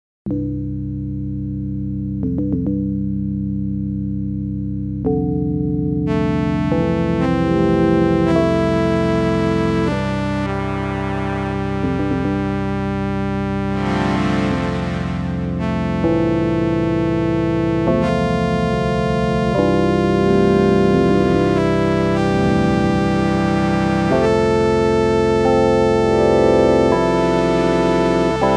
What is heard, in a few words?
organ flute; gombulator